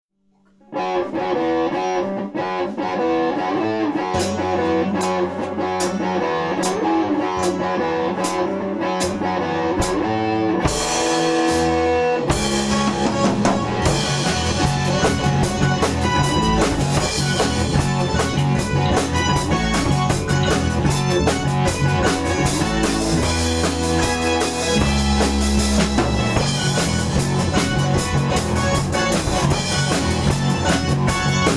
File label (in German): Instrumental